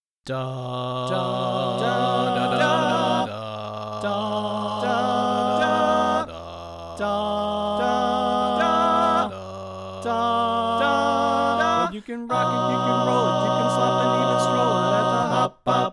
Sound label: tenor; Pennsylvania Groups; NBC